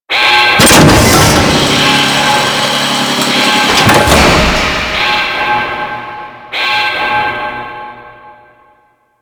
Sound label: Space Core Open; Warframe; Ambience; Space Battles